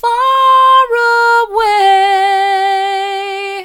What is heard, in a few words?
faraway mid, vocal